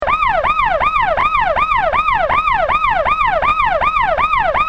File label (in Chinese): 警急; 告警; 广播室铃声系统